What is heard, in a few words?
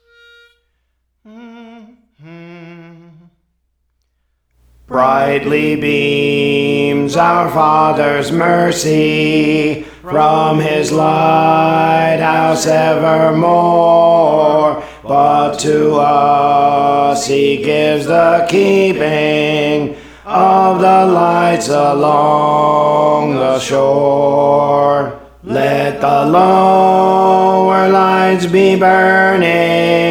Alto, Songs; Praise For The Lord Training; Let the Lower Lights Be Burning